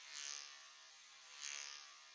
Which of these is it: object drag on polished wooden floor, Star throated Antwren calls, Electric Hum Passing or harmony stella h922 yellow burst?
Electric Hum Passing